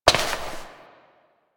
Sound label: Step Rock; Warframe; Koumei